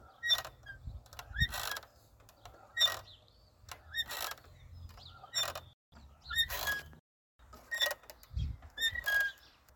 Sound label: Woden Swing